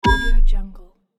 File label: electronic access denied